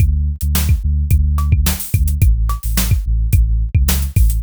k pop beat bass groove 108bpm

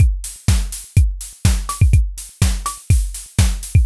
house drum loop 124bpm

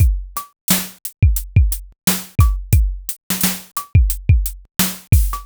boom bap drum loop 88bpm

